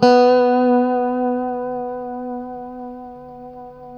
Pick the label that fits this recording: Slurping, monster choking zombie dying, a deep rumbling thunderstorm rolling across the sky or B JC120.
B JC120